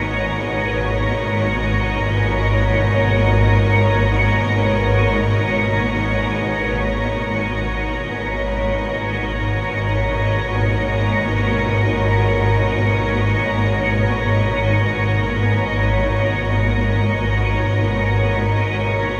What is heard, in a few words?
100 Eb7sus4 Ambiance, Ambient String Loops; Diffraction